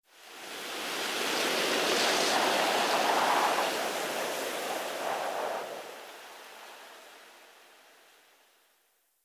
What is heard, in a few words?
wind leafy whistle; amb elements; modern warfare 2